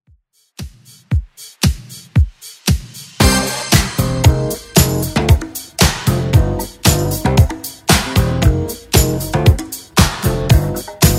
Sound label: 80s Redrum 115bpm